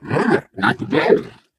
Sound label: ballbot nice catch